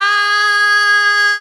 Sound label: groaning; Notes